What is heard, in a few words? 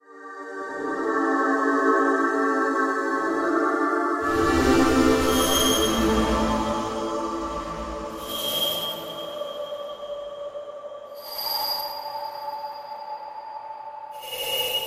shrill medieval rain; firstvictim